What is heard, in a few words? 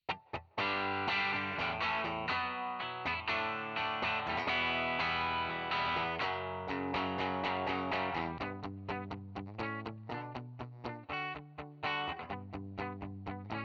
guitar amp; reason essentials